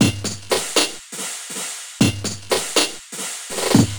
Jung Beat, Electronic Beats; Sonic Foundry (Sony Creative Software); Myths of Technology

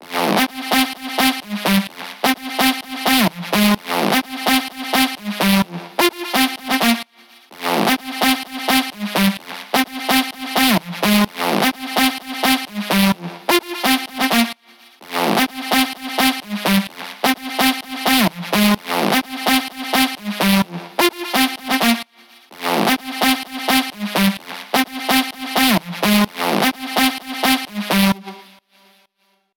128 BPM lead Synth Wet SC, G; Vengeance; Essential Clubsounds Vol.5; Demo Constr Kits; Sidewalk Kit

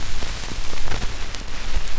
SCRCH NOZ, Record Noise

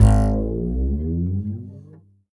Upright Acoustic Bass, 1ST POSITION; Larry Seyer

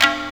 PRC TABLA; AMG - Now CD-ROM (Roland)

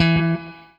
ROCK, Guitar; 90 s Sample CDs; Quantum Leap - Guitar & Bass

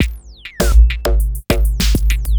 drloop, drumloops, Vinyl Attack; Masterbits

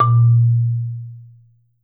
MSOFT A#1, Marimba

